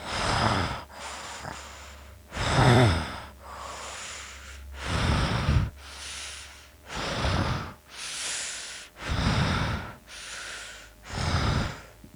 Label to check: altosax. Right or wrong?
Wrong